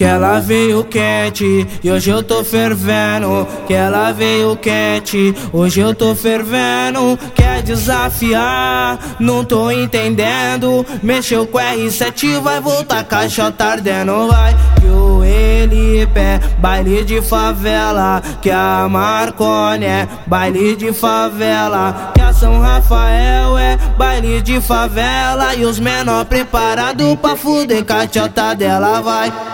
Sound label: ACAPELLA